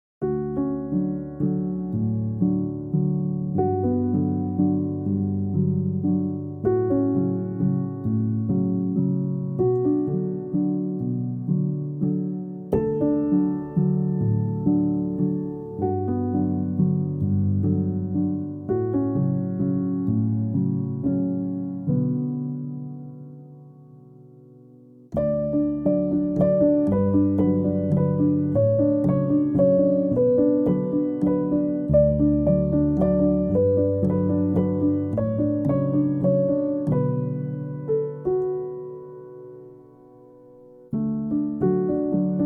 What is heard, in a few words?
relaxing, a song without words for studying